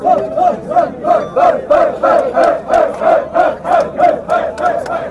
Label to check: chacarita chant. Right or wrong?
Right